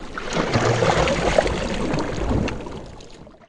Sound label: Canoe Paddle Stroke Underwater